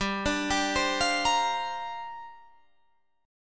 Bb 6th Add 9th G

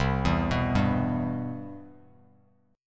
C Major 7th, Bass Standard; CM7 arpeggiated; JGuitar

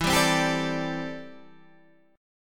A Minor 11th E, Chord

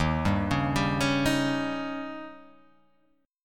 Eb 7th; x,x,1,0,1,2,3,0; Eb7 arpeggiated; JGuitar

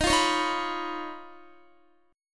Eb 7th Suspended 2nd Sharp 5th Eb, Guitar; Eb7sus2#5 strummed; JGuitar

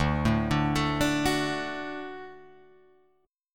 D Major D down Guitar Drop D

